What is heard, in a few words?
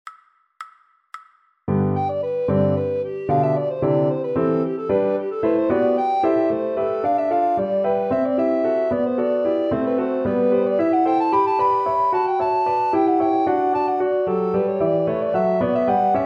Recorder; Murril